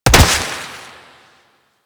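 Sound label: Flak Cannon Projectile Hit; Grineer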